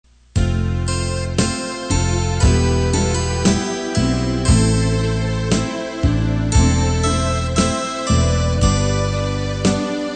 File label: BASS